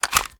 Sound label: reload empty mag out, reloads; gmod